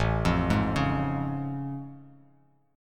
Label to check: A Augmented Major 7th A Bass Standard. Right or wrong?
Right